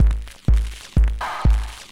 Tech loop

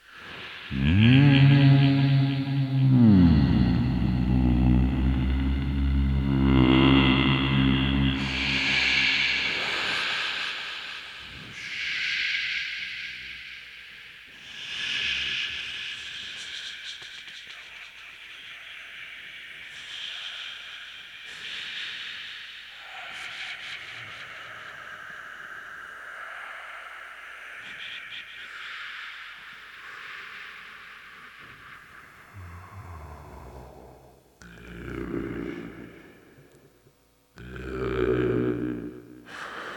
Chant

